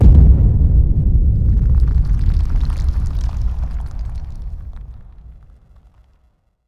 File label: cave occasional